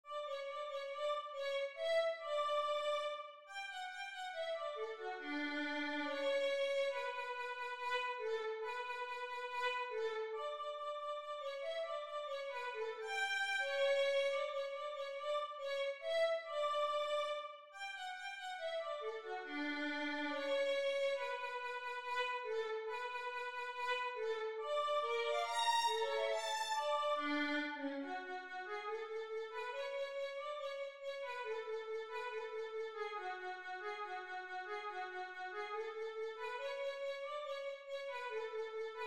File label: nutcracker violin